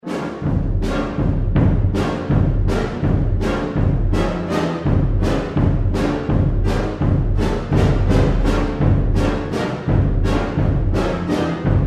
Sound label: Symphonic band; Massada